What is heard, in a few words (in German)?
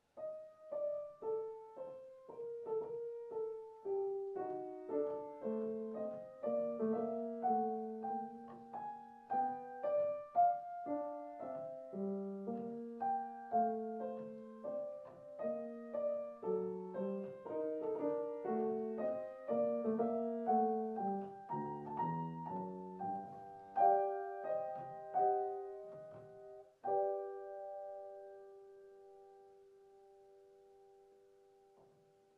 Klavierstuck G Moll fur Klavier; fur Sarah Burney Payne; Allegretto quasi andante